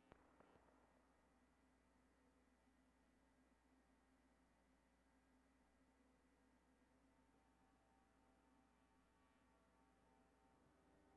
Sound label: Guitar; FULL BAND